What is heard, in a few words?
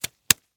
Latex Glove; Blastwave